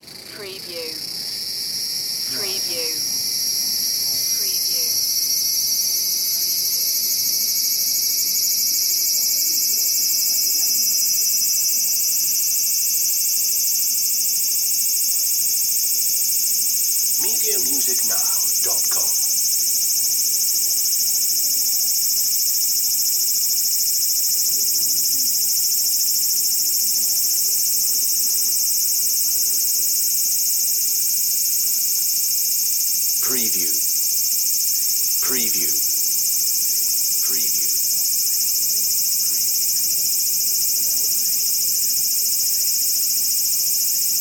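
insect call cicada sci fi sounding long Tokyo Japan cemetery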